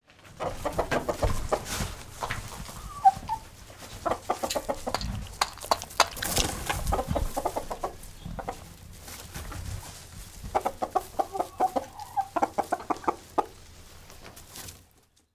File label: Chickens Moving And Clucking; fesliyanstudios